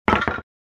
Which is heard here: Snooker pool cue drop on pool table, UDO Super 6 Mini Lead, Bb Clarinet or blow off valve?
Snooker pool cue drop on pool table